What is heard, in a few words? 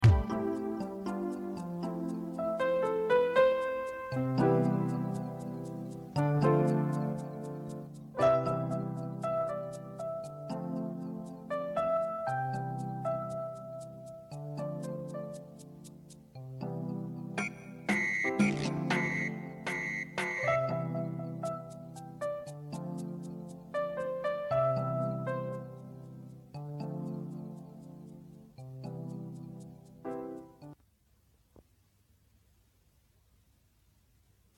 Piano